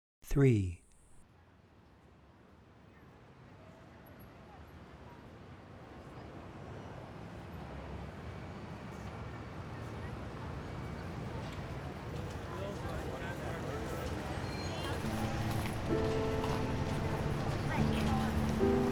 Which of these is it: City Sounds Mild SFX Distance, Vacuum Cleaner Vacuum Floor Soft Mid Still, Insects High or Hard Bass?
City Sounds Mild SFX Distance